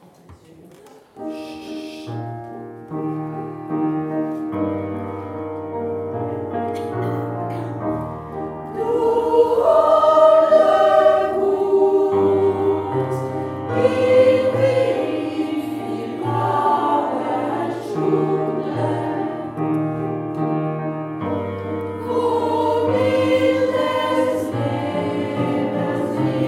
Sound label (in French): Soprano Alto accompagne